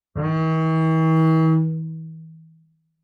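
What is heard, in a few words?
Bass arco fortissimo sul D Eb3, Double Bass; MIS Pitches - 2014; Strings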